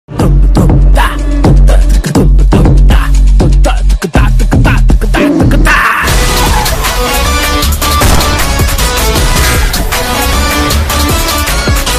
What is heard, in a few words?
trailer bgm ringtone; thalapathy vijay; venkat prabhu